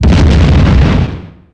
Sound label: explosion; PonPonChun; Android